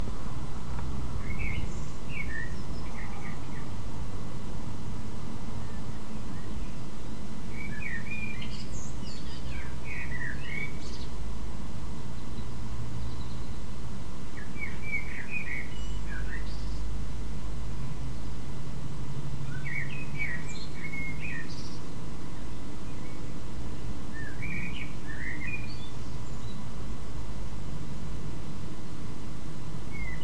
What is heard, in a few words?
bedroom window blackbird recorded from the window of my childhood bedroom; childhood bedroom in milton keyne